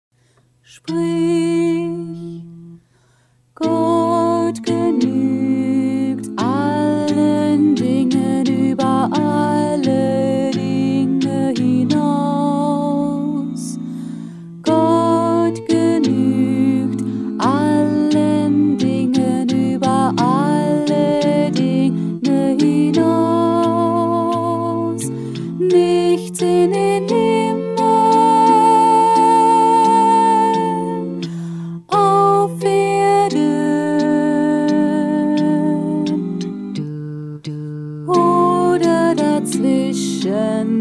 alto, choral music

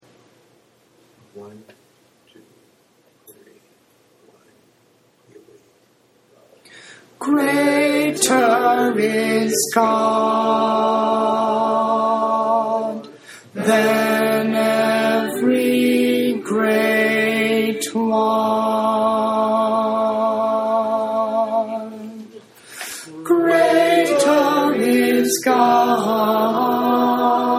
tenor

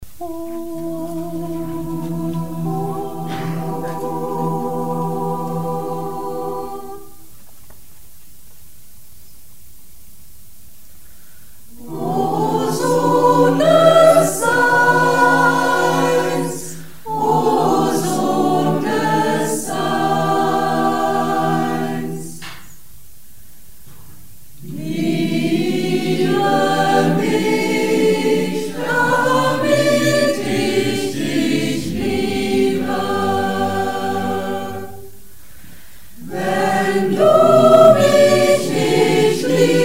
tutti

